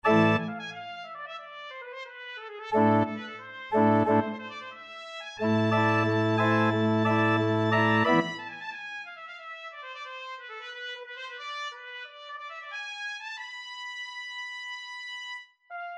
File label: Concertante Vivace, trumpet solo with organ